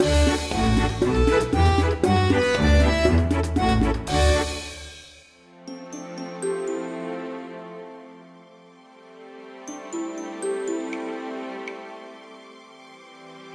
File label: Bgm - Game Win